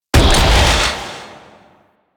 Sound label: Sidearm Charged Shot; Weapons; Warframe; Tenno; Wraith Sidearm